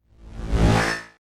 Whoosh SciFi Saber Slash; Light Sabers

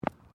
FOOTSTEPS FOOTBALL BOOTS CONCRETE STEP; Footstep Foley; Footsteps (Sound Effects:Human)

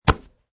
Slap Noise

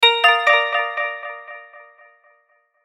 Musical Twinkle; Musical Twinkle Hits Delay; Miscellaneous Musical Sound Effects

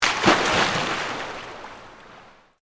Pool Indoor Splash, Water; BlastWave

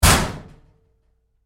Door Locker Impact Roomy Hard, Metal Door; Metal Doors (Sound Effects:Doors); Big Room Sound

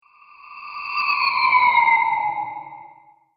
Time Warp, sci fi

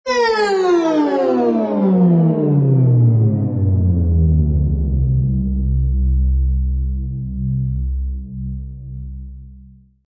Med Dive, Medium toned diving sound; Rise And Dive Sound Effects; Rises and Dives (Sound Effects:Sound Design)

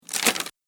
Rough Paper Noise; Paper Sound Effects; office

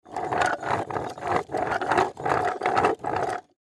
Cart Wood Small, Cart Empty Roll Back Forth; Rolling; Foley; Foley Cart Roll Creak Wheels; Objects; Blastwave

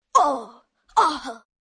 Impact Multi, Grunt, Impact, 1 boy, double; Pain; VoiceBox

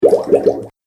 Goop Sound, cartoon; Cartoon Sound Design Sound Effects

